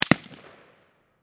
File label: Gun Machine Gun, Gun, Machine, Gun, .223, Caliber, Single, Shot, Distant, 100, Feet, Towards, Bushmaster, Ar-15; Warfare